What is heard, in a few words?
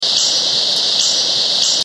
Black-Headed Grosbeak (Pheucticus melanocephalus), A sharp ik or eek (P,L). / Loud, sharp pik or peek!. / A hard, sharp spik (F).; FAMILY EMBERIZIDAE